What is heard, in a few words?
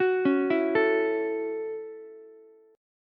Gb Minor 7th Gb, Ukulele; arpeggiated; 11,13,12,12; JGuitar